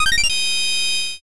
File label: fullcombo